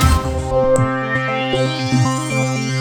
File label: T-WAVE, Synth Waves